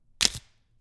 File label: Celery Snap